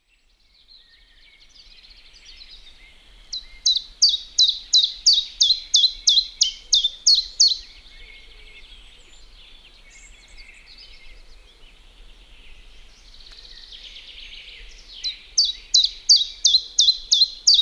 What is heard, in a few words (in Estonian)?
väike-lehelind